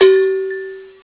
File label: change form basic; Bounce Touch